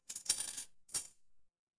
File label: impact coins